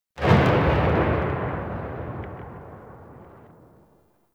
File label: Sniper Rifle Alt Tail; Warframe; Weapons; Corpus